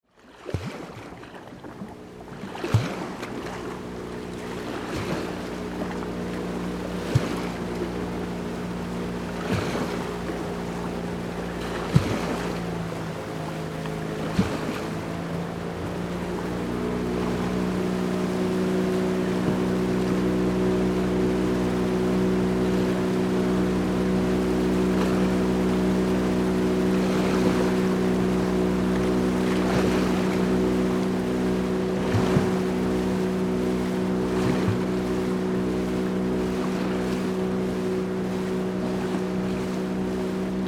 Motorboat passing slowly gentle waves breaking on a beach